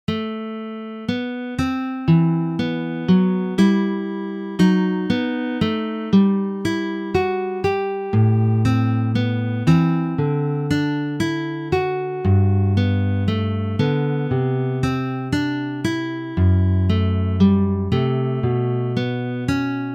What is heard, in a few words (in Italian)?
Fughetta grazioso